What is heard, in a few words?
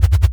helicopter